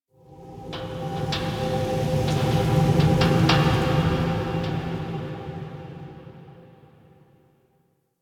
Park Wind; Ambience; Warframe; The New War; Quests; Zariman

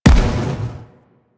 Energy Rifle Split Explosion; Warframe; Venus; Venus Grenadier Spaceman Agent

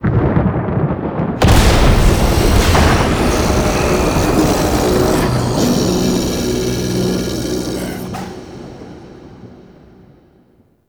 Murmur Exterior Thunder Clap H; Warframe; Ambience; Duviri

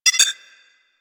Mini Moa Beep Slow; Warframe; Corpus; Arisoctrats

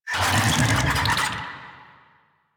Infested Big Ashen Vocal Attack Death; Warframe

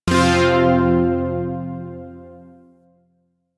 Shawzin Chromatic Chord; Zariman Shawzin

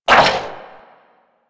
Struggle; Warframe; Amalgam Override; Abilities

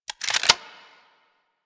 Reload, Weapons; Warframe; Boar

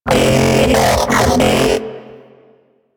Mech Ambient Vocal

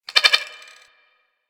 Beep Stutter; Warframe; Ambience; Corpus

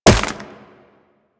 Wall Jump Ice

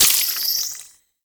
Baby Resource Pick Up; Warframe; Nokko; Reroot

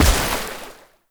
Heavy Step Water, Footsteps; Warframe; Tau Dax

